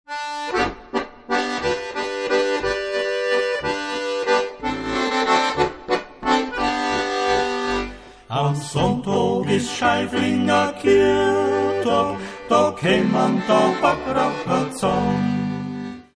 maennerquartett

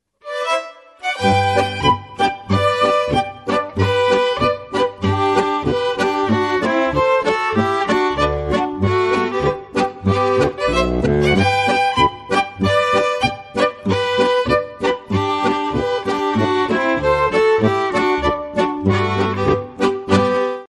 Bauernmarsch; blaumocha

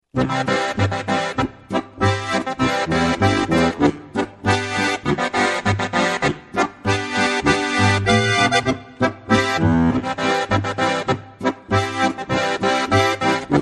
Schottisch

